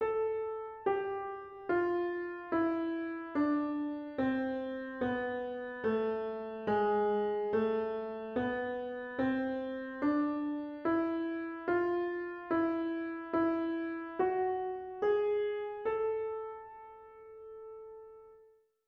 Escala completa do modo menor

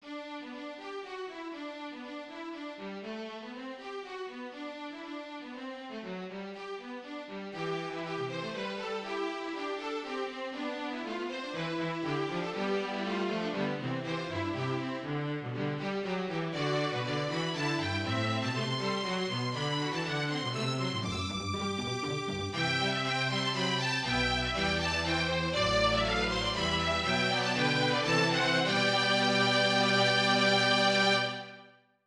Cantilena Quinteto

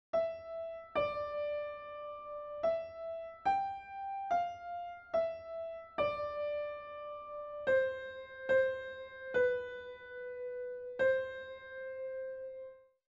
Acorde de sétima de dominante como acorde de passagem; la Motte

